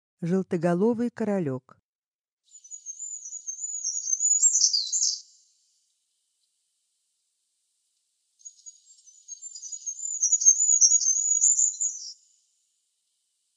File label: regulus regulus bird